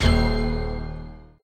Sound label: lost star; papersiomania